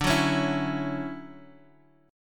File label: D-Major 9th, D, Guitar, Open G